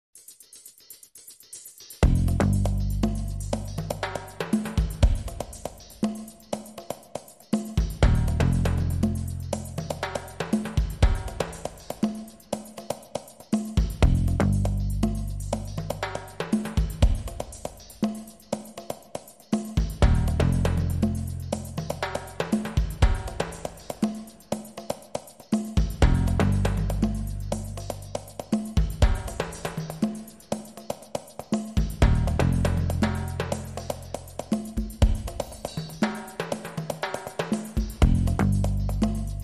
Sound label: Drums Bass & Percussion